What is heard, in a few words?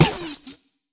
fx drum